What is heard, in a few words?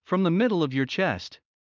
Heart fast; Classroom humanbody